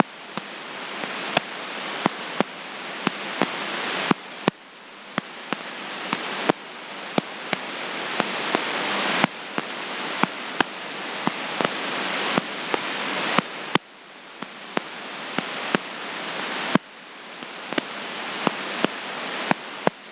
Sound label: PL Adapter Interference